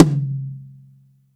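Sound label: TOM loud; Zero-G; Chemical Beats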